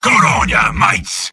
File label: sniper cheers; mvm